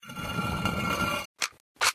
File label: fim92 reload; mcheli